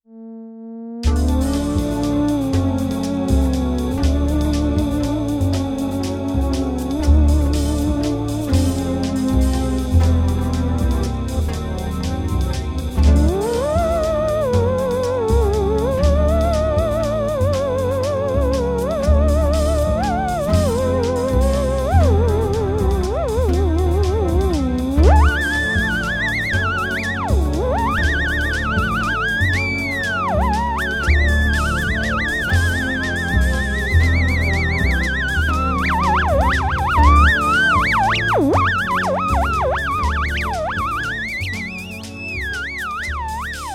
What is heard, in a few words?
Theremin